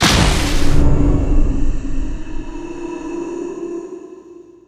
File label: soul rip cast; the doors 2 creatures; JJK